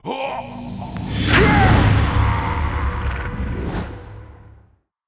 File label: ghost captain death, monster